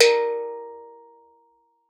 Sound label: steeldrum A#3; Xsample VOL-4